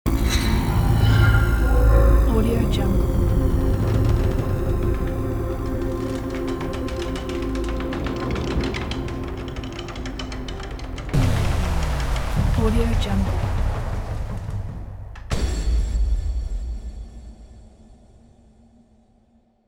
dark suspense logo; kipaloops

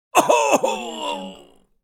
pain grunt

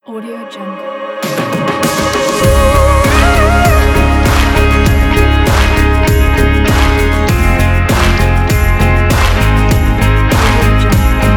inspiring summer pop background

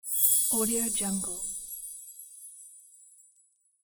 gold shine

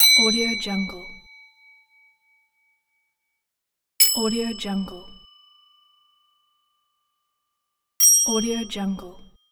door bells

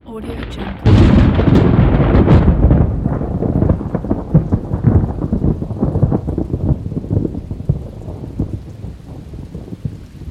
thunder with rain

